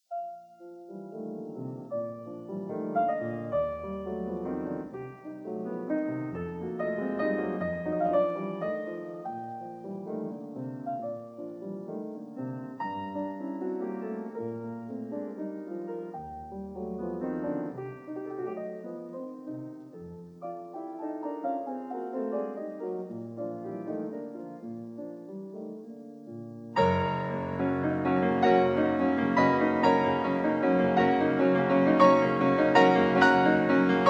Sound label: Prelude No. 21 B flat major; F. Chopin; 24 Preludes, Op 28